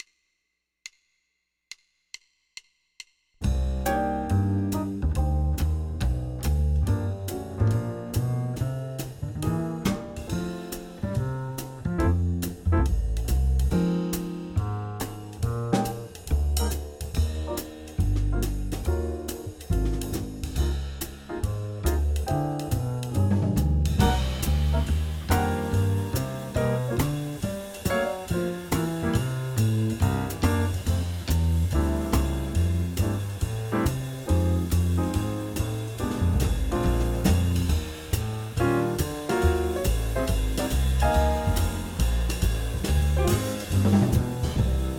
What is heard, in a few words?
Jazz Etude; Etude #2 track only